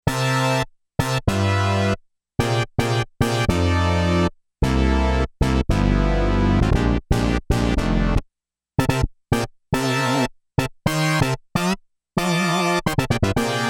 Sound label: Hit, Yamaha DX11; Factory Performance Voices